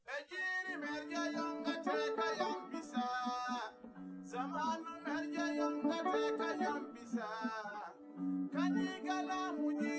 nodrums liverec 250ms 5cm; SiSEC08; SiSEC underdetermined

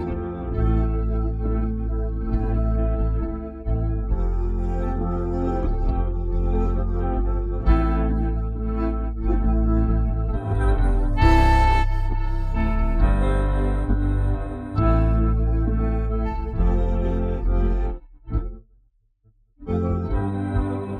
bass; SiSEC11

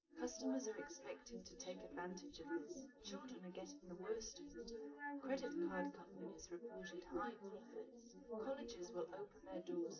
female live rec 250ms 1m; SiSEC11; underdetermined

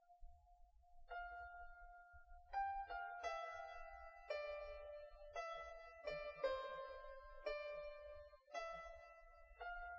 nodrums liverec 250ms 1m sim; SiSEC08; SiSEC underdetermined

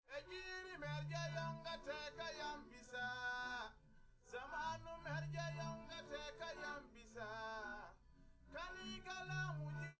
test no drums live rec; SiSEC11; underdetermined

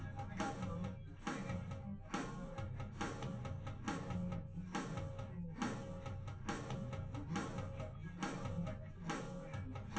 drums liverec 250ms 5cm; SiSEC11; underdetermined

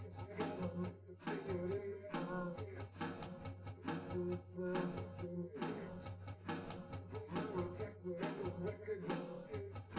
drums live rec 250ms 1m; SiSEC11; underdetermined

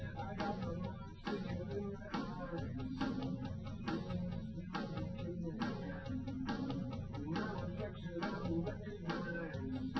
wdrums; liverec 250ms 5cm; SiSEC08; SiSEC underdetermined

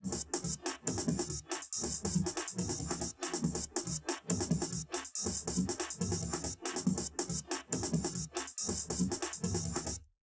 drums; SiSEC10; underdetermined

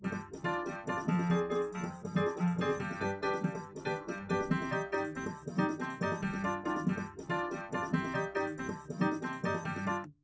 wdrums; SiSEC10; underdetermined

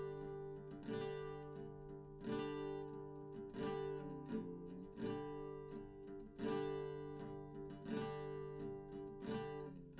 nodrums liverec; SiSEC11; underdetermined

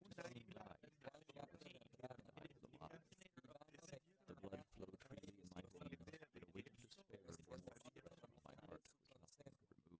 male inst sim; SASSEC07

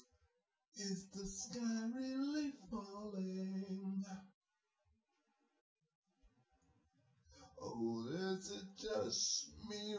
nodrums synthconv 250ms 5cm

